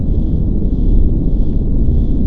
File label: barrier act